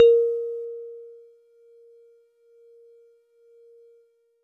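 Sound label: Warble Celeste DX100 A#0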